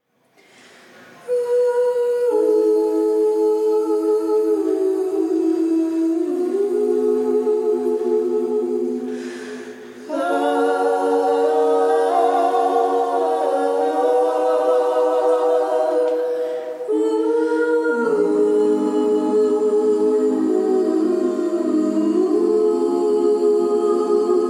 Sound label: Picture Frame (A Capella); Work-in-progress; unfinished